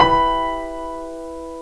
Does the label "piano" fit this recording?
Yes